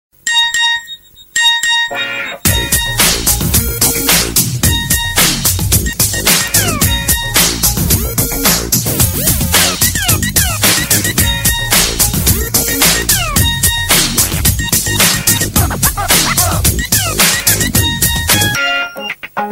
nokia improved sms ringtone

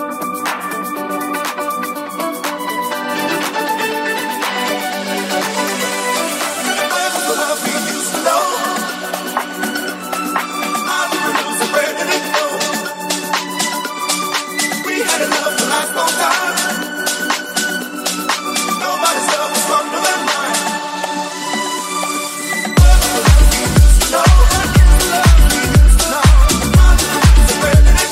ringtone

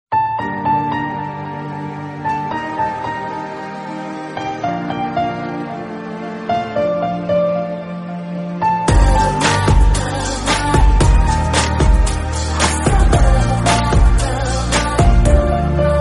soft romantic musical tone ringtone

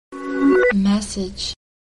cute classical message tone

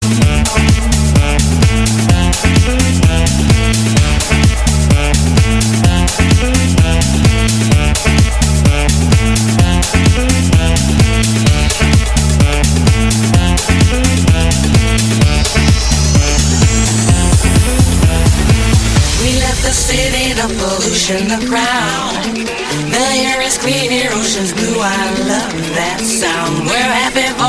dance music call tone ringtone

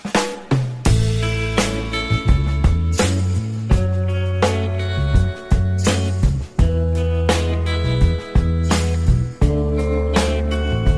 flute gaiter music ringtone, bollywood

